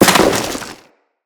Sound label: Crewman Land Dirt, Footsteps; Enemies; Corpus